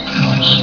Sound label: crunch